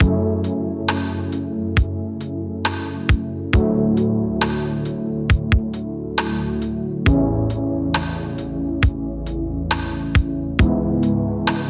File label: Lo-Fi Neon Lounge Main